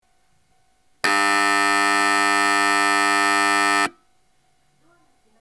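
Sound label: Mishima Hirokoji departure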